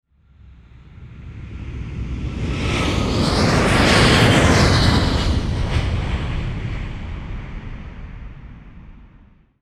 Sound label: Jet 777 Pass By